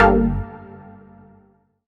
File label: Synth Shot G